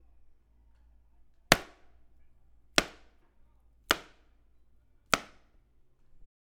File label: Magazine Cover Hit